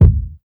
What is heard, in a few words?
puffy kick drum sound d sharp key; kick drum samples